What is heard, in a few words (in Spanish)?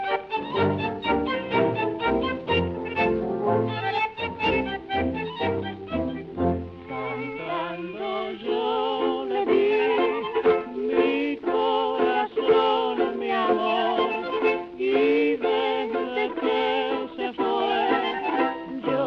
cantando